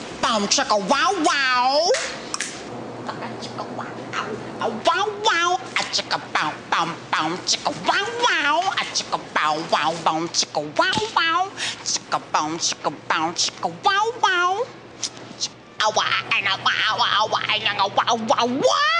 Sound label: Bow-chica-wow-wow